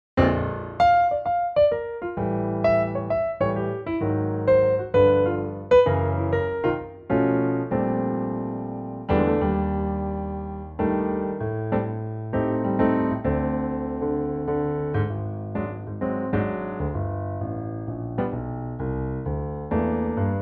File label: Bb piano; Pianotrak; key - Bb - vocal range - Db to D (optional Eb); Fresh 2024 piano only arrangement of this classic standard.